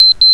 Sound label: Electric Beep; Focus AudioClock™ v3.1.1